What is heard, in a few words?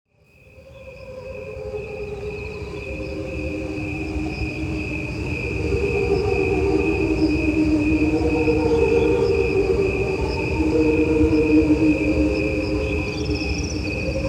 crickets short, Sounds Music of Nature